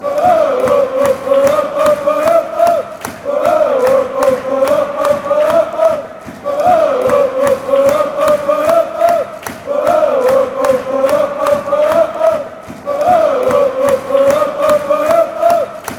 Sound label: anderlecht chant